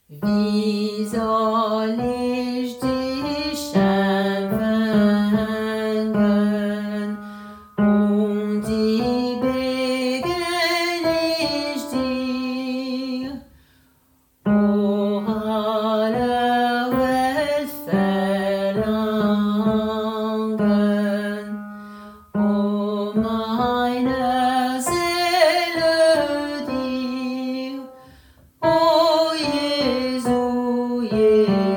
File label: Wie soll tenor